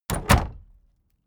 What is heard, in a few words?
case lock close hard; objekte; tueren